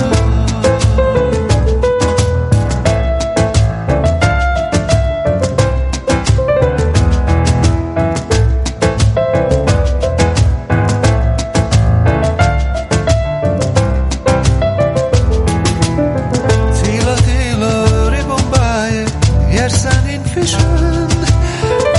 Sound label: Piano